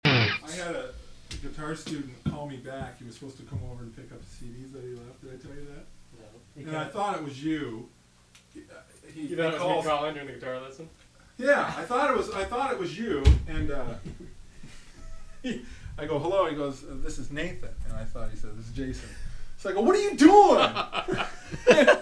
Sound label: guitar